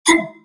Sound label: Stomp; Drash